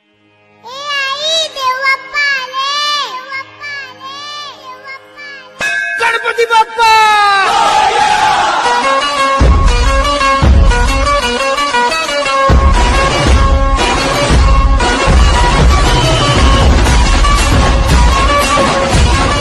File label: ganpati bappa bgm ringtone, ganesh ji bgm ringtone